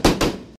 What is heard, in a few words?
rifle